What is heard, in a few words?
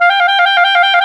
WND TRILL, Clarinet FX; Roland LCDP04 Orchestral Winds; 90 s Sample CDs; Clarinets